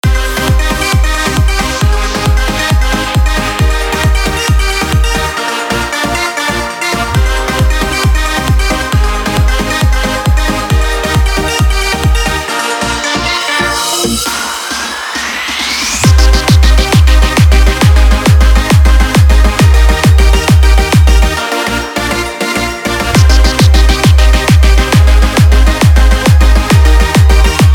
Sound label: TAPORI EDM; ANIMAL 2